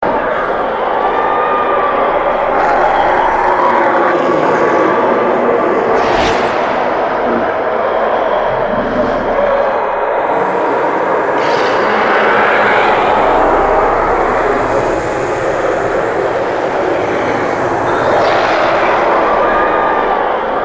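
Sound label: hell Ambience loud; F.E.A.R. Extraction Point; Vault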